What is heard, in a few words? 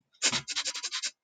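sounds of armadillo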